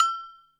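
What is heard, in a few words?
Drum Analog Kit Agogo High, Yamaha RM1X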